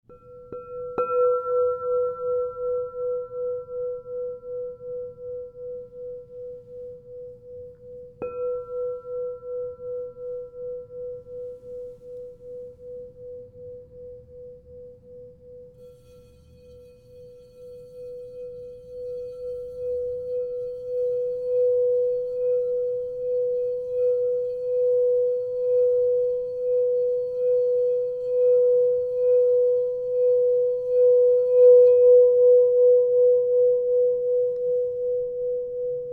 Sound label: Crystal Tones Singing Bowls